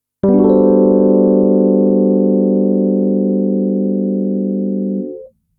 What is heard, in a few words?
Maj7add4